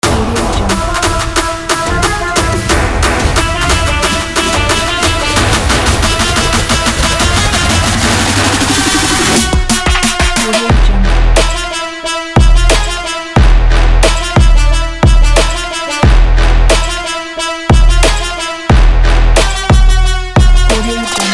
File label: middle eastern trap